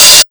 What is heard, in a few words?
HH open; Big Beat Samples & More; AKAI